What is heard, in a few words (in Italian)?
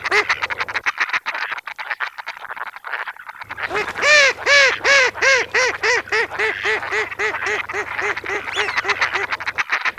GERMANO